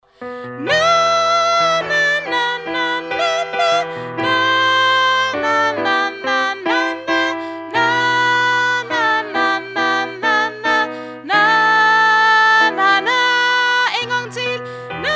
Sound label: tenor